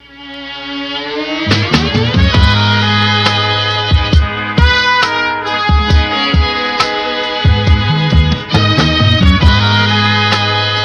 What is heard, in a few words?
Beat, Gm, 136; Beat Block purge vol 01